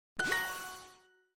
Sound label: in a row correct